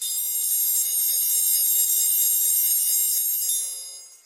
triangle roll

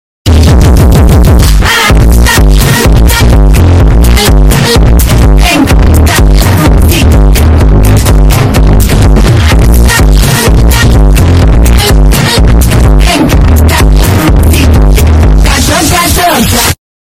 bass boosted

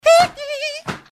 hee heee

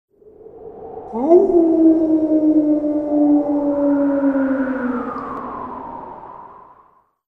werewolf howling

